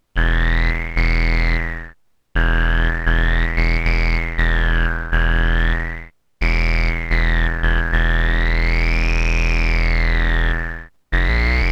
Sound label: E-mu Super Bass